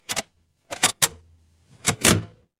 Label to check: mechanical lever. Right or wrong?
Right